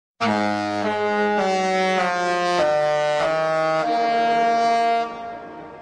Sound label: cruise ship horn